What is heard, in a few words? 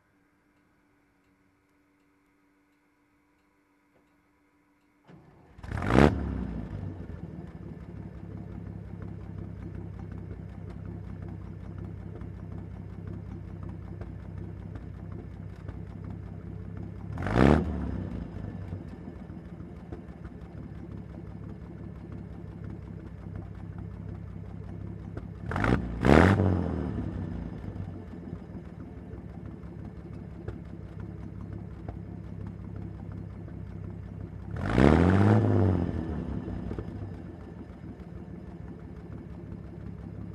Dune Buggy engine rev up